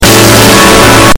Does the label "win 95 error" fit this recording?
Yes